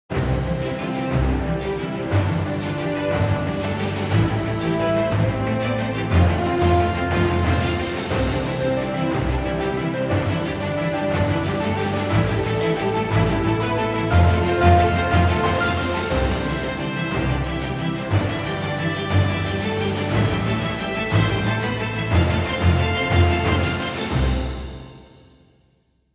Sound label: Romantic Epic Background Music